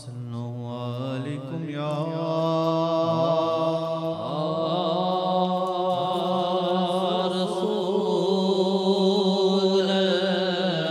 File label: naat; khurram ko bhi dikhlao ke kya cheez karam hai